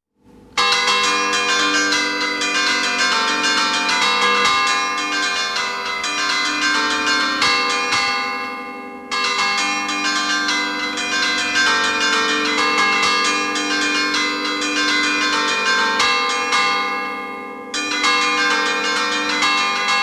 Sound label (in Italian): campane